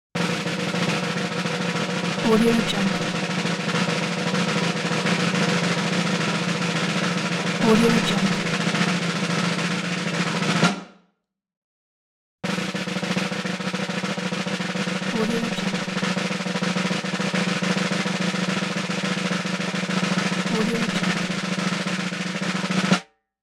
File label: snare roll long